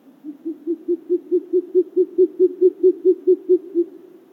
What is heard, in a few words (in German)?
sumpfohreule